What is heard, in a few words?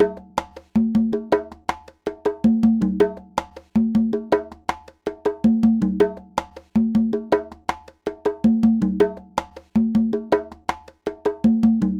Conga, Latin Percussion